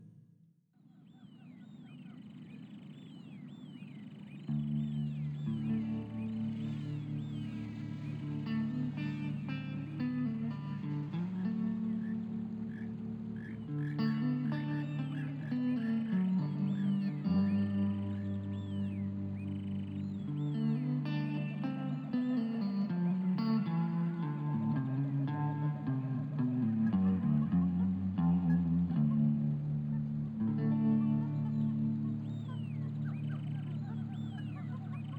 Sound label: Delta Guitar; Meet Mr. Hippo; putt putt saves the zoo